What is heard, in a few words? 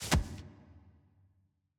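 ambience room polygon punchbag training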